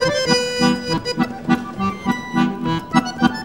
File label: ACC LINE, MELODIC; Best Service; World Colours